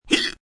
Hiccup Donkey, Hiccup, male, donkey-like (human voice); Hiccupping